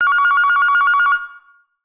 Business Phone, ringtones; Fujitsu F-02J

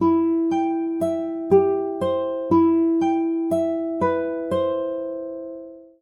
Classic Guitar

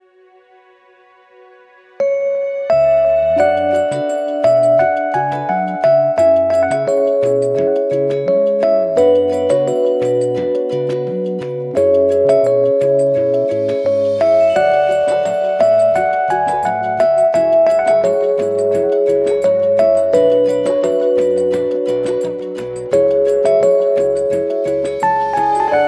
ElectricPiano; Walton Primo-NF2-Plus; ringtones

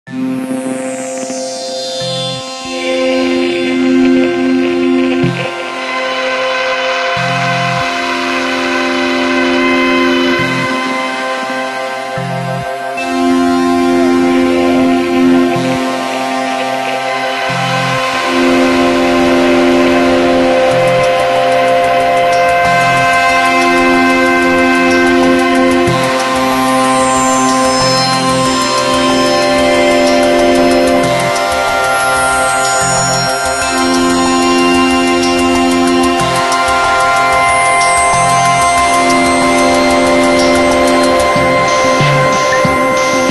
Grinder Alarm Long; Sony Ericsson; W902; PlusFourSix; Patti; Thomas Tibert

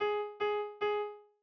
call drop; Acer neoTouch S200

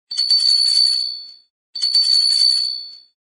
Wind tone, phonetones Samsung SGH-E250i; Samsung SGH-E250i Southeast Asia

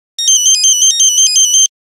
Nokia Buzzer Chase; Windows Phone apps

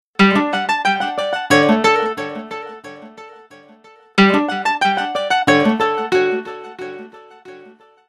Ring, Windows Mobile ringtones; Nuevo; Microsoft

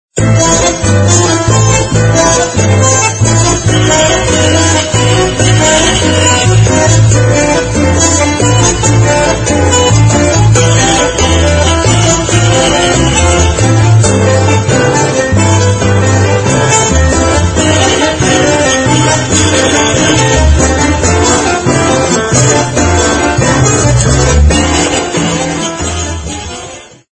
Ringtone

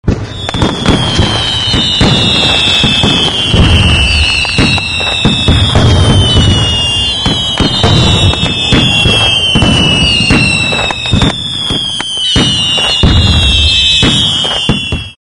fireworks, ringtones; Sony Ericsson

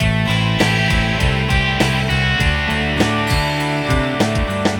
brit-pop, ringtones; Google; NewWave Labs

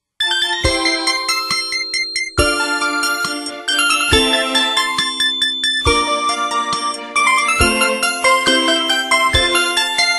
Magic Flute; Asus P525

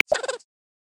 Norway Lemmings

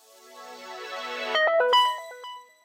Power On; LG; G3-S

